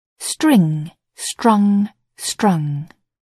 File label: string